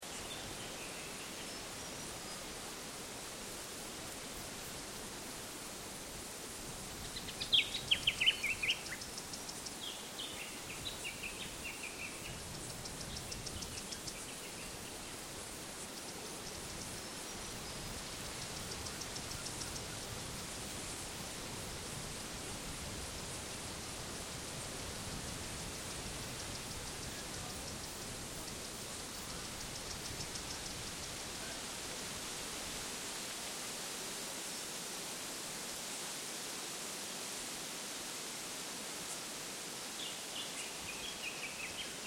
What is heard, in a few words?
Ambience Victoria Wind Insects Birds; Regional Ambience; Outdoors (Sound Effects:Backgrounds)